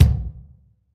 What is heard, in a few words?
huge kick drum c sharp key; kick drum samples